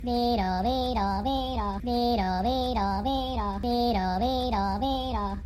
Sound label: minion siren